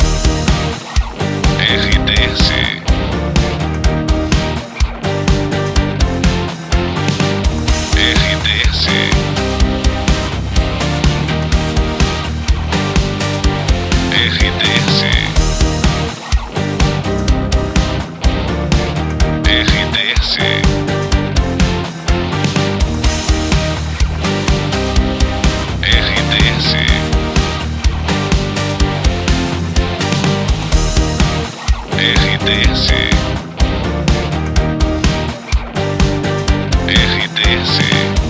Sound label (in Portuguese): trilha locução; Pacote 92 FM, Timbó/SC. Pacote com característica pop rock